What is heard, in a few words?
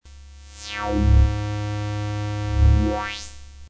synthacon square; modular; vcf synthacon